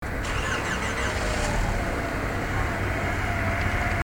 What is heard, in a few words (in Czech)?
auto start